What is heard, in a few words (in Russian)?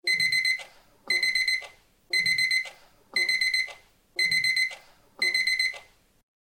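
электронный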